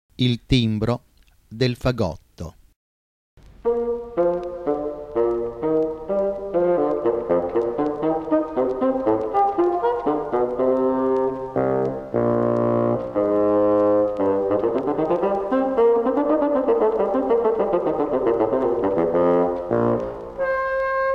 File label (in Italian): Fagotto, il timbro del fagotto